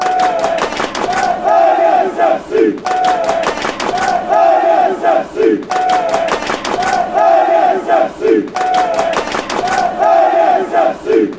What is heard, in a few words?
accrington stanley, chants; Accrington Stanley... Exactly! Originally formed in 1891, it came back to life in 1966.